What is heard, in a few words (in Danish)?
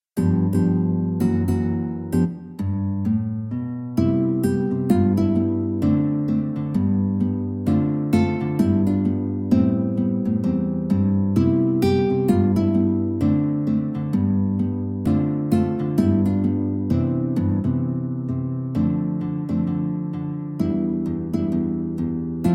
Swing It guitarorkester